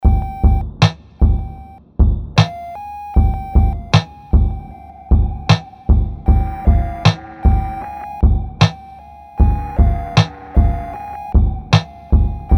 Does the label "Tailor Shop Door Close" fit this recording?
No